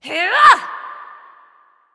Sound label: grunt; Smite; athena